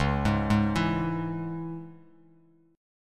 C sharp Minor down Bass Standard; C#m; arpeggiated; JGuitar

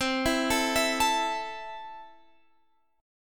E Suspended 4th Sharp 5th C

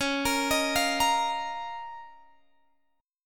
Db 6th Add 9th Db down Guitar Open G

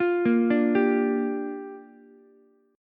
G Minor 7th, Ukulele; Gm7 arpeggiated; JGuitar

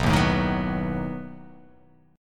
B 7th B down

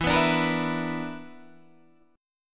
B Minor Major 7th Double Flat 5th, chord

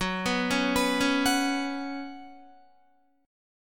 Gb Suspended 4th Gb, down, Guitar; arpeggiated; 4F,4A,5C,5G,5C,6E; JGuitar

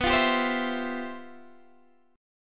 G 13th B, chord

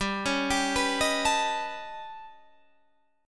A 9th Flat 5th G, chord

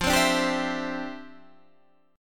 D Minor 11th F

